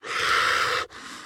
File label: zombie run; smite; npc; roman archer